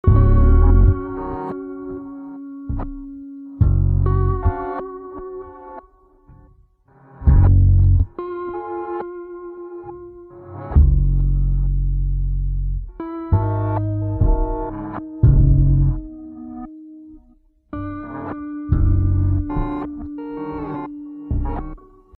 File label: Loop